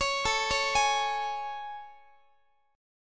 A Major 7th A, Guitar; AM7; arpeggiated; x,x,16,19,17,19

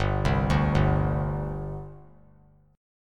G Suspended 4th; Gsus4 arpeggiated; JGuitar

